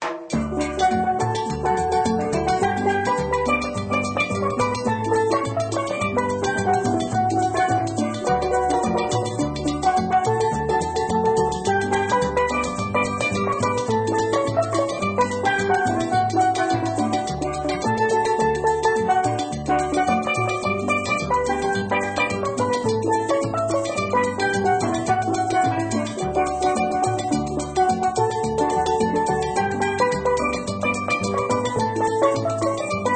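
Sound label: Music Ambient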